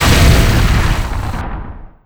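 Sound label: Laser Hit Interior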